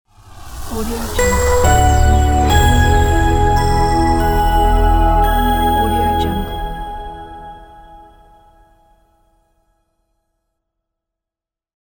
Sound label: elegant corporate logo